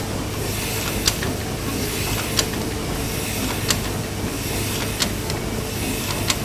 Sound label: Steam Engine Valve Loop; blindside